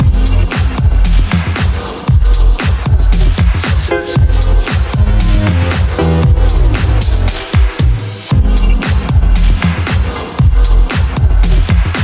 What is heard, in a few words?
Emote Pop Lock; fortnite emotes; Athena